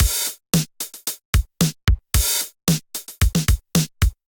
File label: 707 Kit groove 112 bpm, Drum; MIDI Clips; 111 - 130 bpm; 707 Rok With-U Kit groove; Ableton; Factory Packs; Drum Essentials